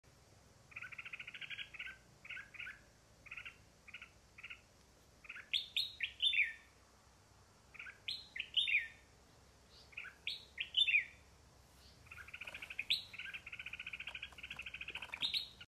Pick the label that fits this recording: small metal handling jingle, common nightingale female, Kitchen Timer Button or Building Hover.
common nightingale female